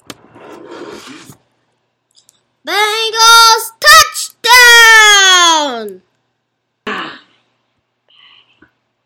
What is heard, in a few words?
fan touchdown; bengal